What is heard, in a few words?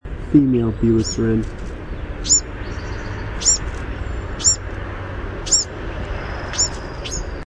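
call, Bewick's Wren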